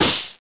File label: fireball hit; Transcription: / Pfft!; Ferazel's Wand Demo